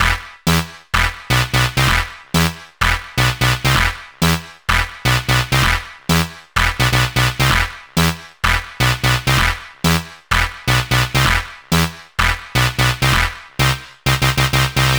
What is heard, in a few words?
Melody 128 BPM Root E; Vengeance Samples; Melody Kits